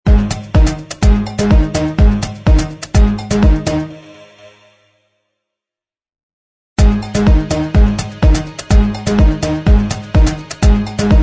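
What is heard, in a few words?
instrumental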